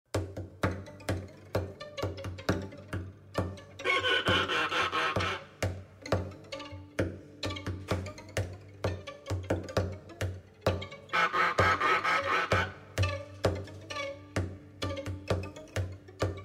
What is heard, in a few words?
instrumental; petit escargot